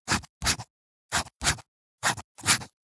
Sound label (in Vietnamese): Tieng dung but may viet cham